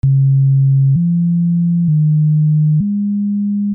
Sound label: BASS Sine